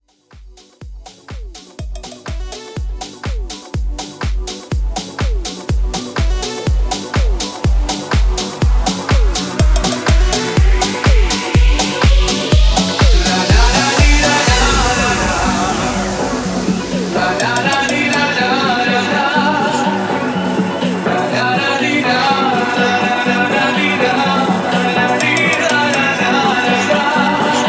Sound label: Future House Intro; Remixfreakz